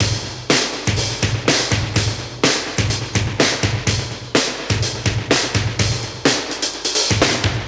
125 Classic Breaks, 125; Touch Loops; ClassicBreakSessions

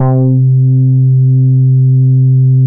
wow bass C4; SE-1 BASSES

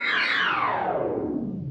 SPACE FX 140; SpaceSounds

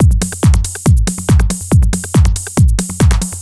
hard beats 140, hard trance beats

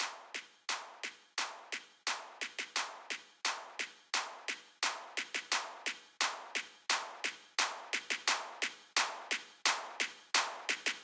snare Buildup

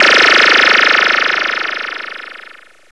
CLICK BURST; KillerTweaksII; DirectWave

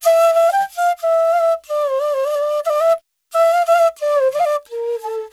ETHNO EXTRA 90; ethno2

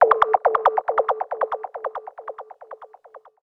KEY SHOT TEKNO 140 A; Techno2; Singleshots

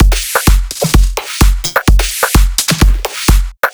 drum loop 128 Full; Anthemic

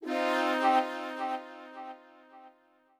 flute, ethno, 160, C

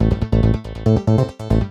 SNTH BASS ELCTR 140 A, Techno

